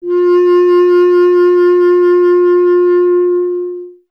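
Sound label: wind bass clarinet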